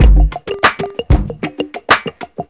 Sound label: Loop